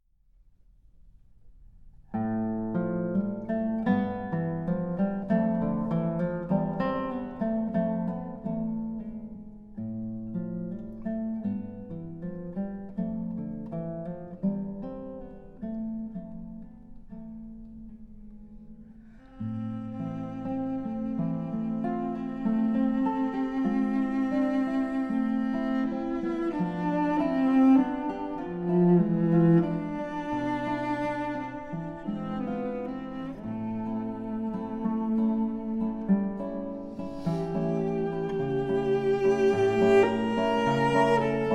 Bachianas Brasileiras No. 5, Aria For Cello and Guitar; Villa-Lobos